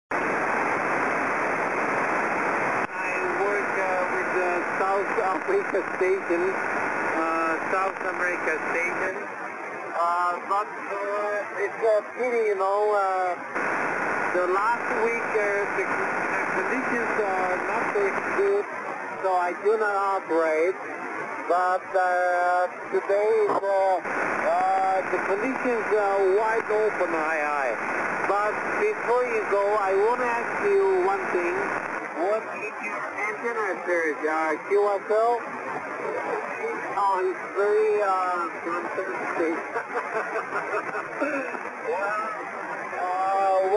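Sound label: 40 dx noise reduction; 40 meters directivity and noise reduction with dx signal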